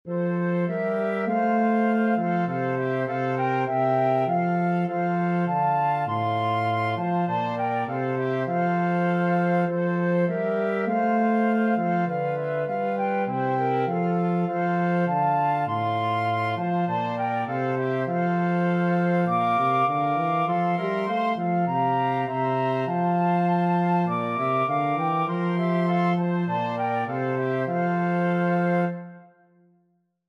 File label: flexible mixed ensemble, 4 players